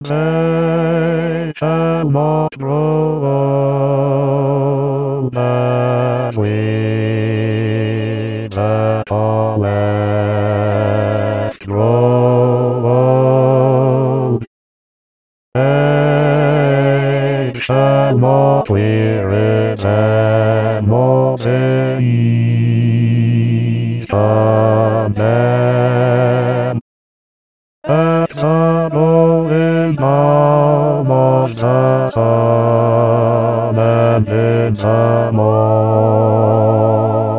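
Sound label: For the fallen Bass; Guest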